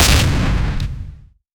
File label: armor